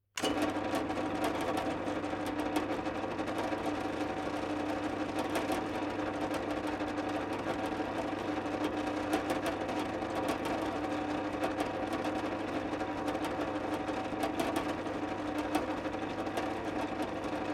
Industrial Metal Bench Drill On Run Off Mechanical Electric Rattling; Drill Sound Effects